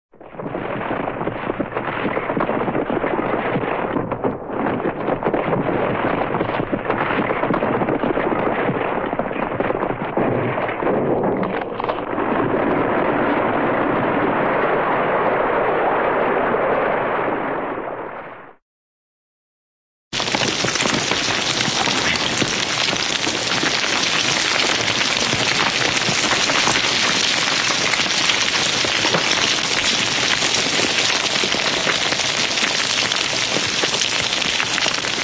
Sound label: rockslide heavy rockslide; cartoon